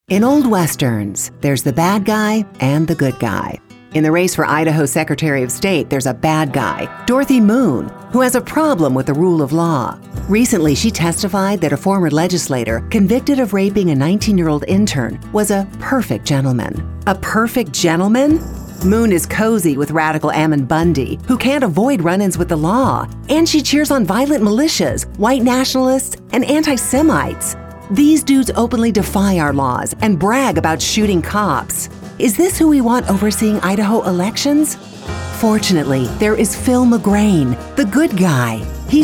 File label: Western 60 Radio Mix